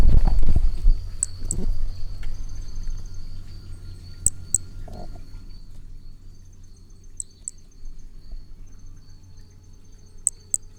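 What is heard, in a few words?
Brachycephalus hermogenesi; Picinguaba; soh o final do canto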